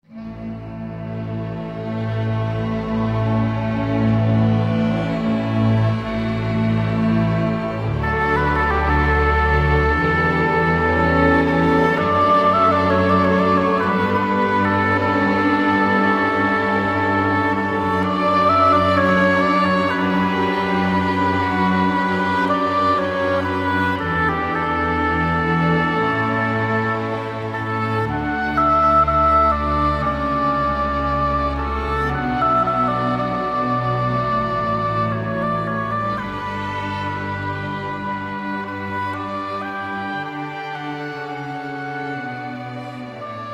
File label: oboe